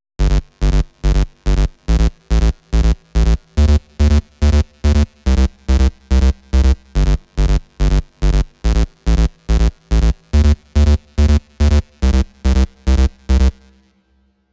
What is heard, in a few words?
PWM Kit 142 BPM Chorus - Bass WET; Vengeance; Essential Clubsounds Vol.5; Demo Constr Kits; Root Gmin; Bassline